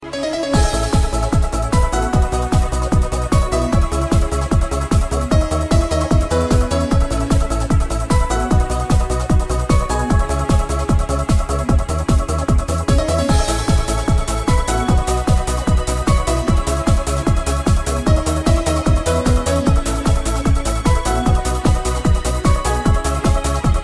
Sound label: trance, ringtone